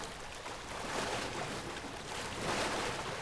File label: ships; The Sum of All Fears